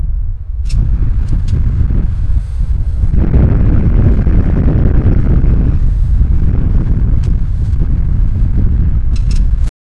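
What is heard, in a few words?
heavy train engine malfunction, metal grinding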